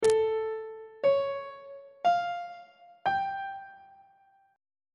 g double sharp augmented 7th chord intervals on treble clef